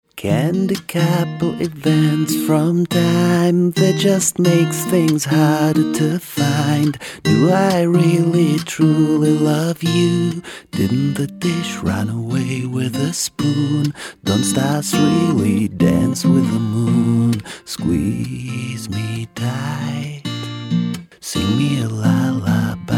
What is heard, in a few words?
lullaby